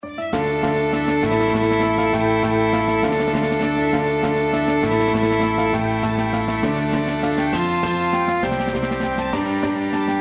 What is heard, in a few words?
flexible 3 players and rhythm section